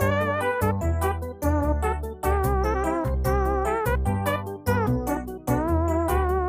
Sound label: Elevator Jingle Loop; lethal company